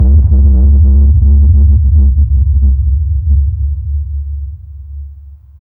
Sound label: ADAMSAPPL, SPACEY DRUMS; Spectrasonic Distorted Reality 1